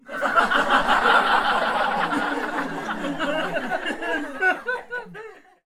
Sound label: laughs